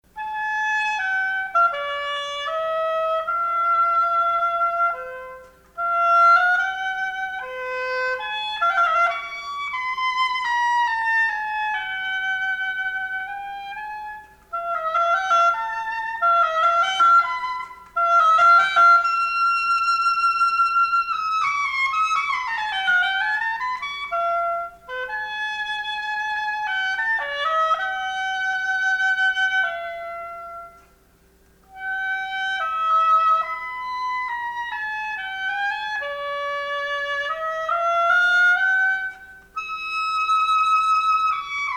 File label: oboe